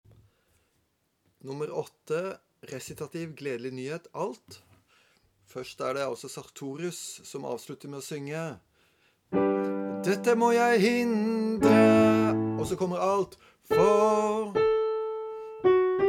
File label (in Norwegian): Gledelig nyhet Alt; lydfiler for korister